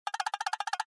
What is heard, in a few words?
dice; LU9000 Arena; Dice Game sounds; LG